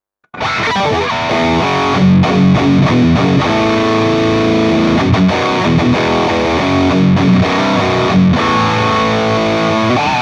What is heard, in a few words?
Solar guitar